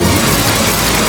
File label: A#4 GEAR; Northstar; The Wizard VOL-1